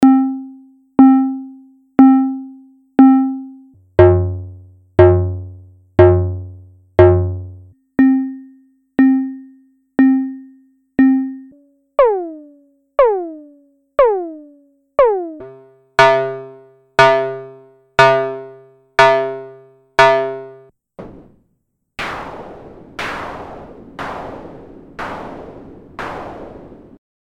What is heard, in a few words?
SY-1M VCO Modes